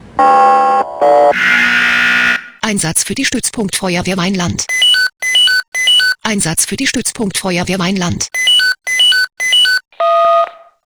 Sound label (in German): SMS Alarmierungstone; FW Weinland